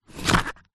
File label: office business cards in card box open